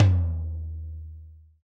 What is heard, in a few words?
TOM TOM, Real